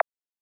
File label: bounce